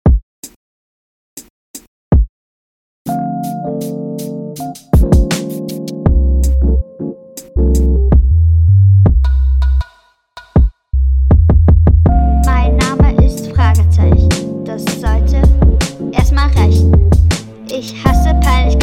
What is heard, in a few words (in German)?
Song; Chillclub 2022 gekurzt